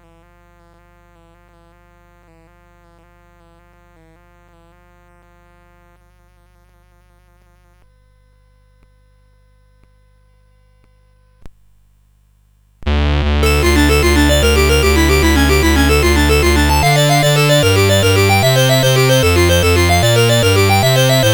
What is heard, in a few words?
Prelude VI in D Major, CSG8580; CSG8580R5